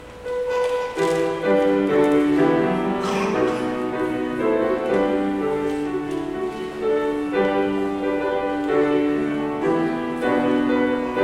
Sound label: Choral; Gesius